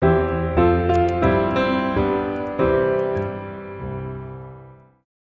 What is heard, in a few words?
Twelve Days of Christmas